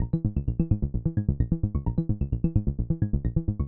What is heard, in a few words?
130BPM Bass, techno; drum fx; Beats